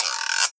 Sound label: Perc Guiro Long